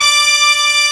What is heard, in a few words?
BRS, ROCK